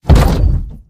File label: step; goliath